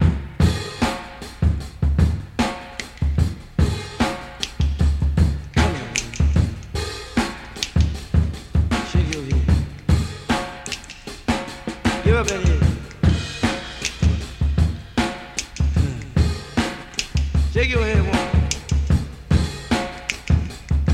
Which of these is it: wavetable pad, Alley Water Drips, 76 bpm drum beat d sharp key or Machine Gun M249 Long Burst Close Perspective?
76 bpm drum beat d sharp key